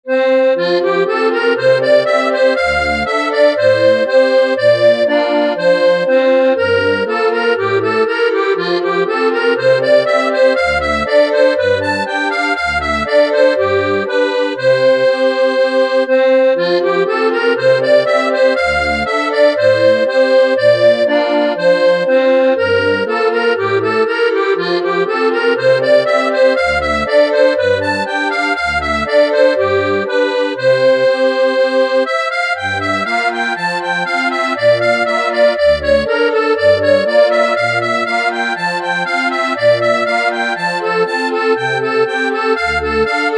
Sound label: harmonica